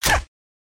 silencer

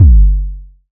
electronic kick; SoundsCrate

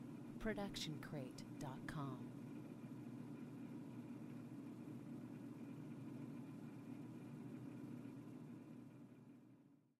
Air Conditioner

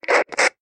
walkie; soundscrate

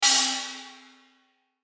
funny gong

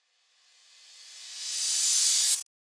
high cymbal reverse no tail; soundscrate

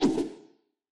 anime whoosh twice

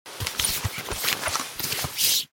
fabric wipes; soundscrate

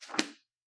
book close

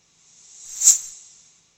Sneaky Whoosh; SoundsCrate

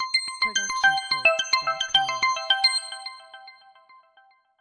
Friendly Synth Ident; SoundsCrate

